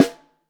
SN3 Top, snare; Ableton Foundation FreePacks; Snare Designer

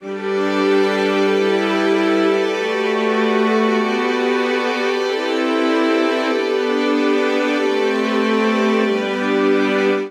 Progression Motion G Major 95 bpm, String Quartet; MIDI Clips; Ableton; Factory Packs; Spitfire Audio

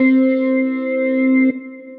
Flatliner Organ; Piano & Keys

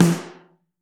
Snare Mono

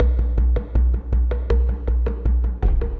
Kit - 80 bpm, Drums; Circle Of Truth

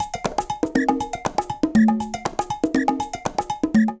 Latin Pop, latin percussion drums; Ableton; Factory Packs

